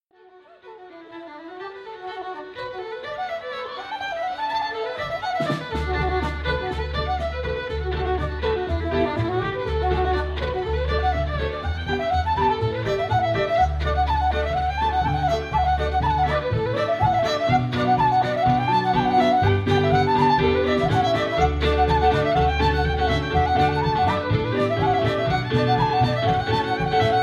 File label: Ceili Band Reels